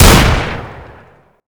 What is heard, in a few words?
spas semi; garrysmod